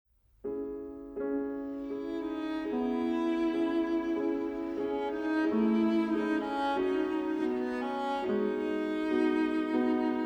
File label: Vocalise arr For Cello And Piano